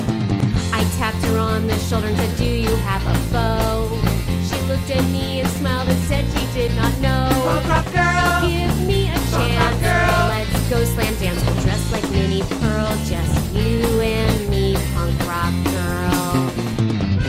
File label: Punk Rock